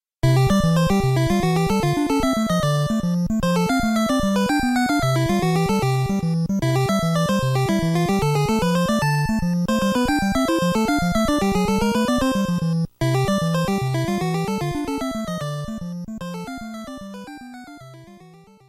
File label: bgm cassette version